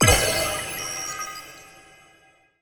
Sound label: notification resolution passed; Stellaris; federations